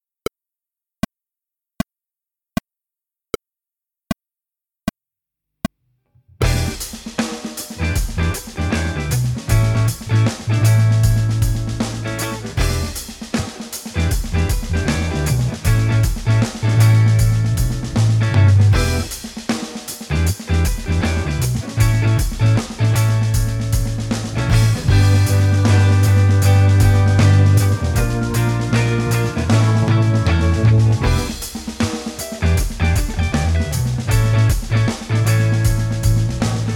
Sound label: With drums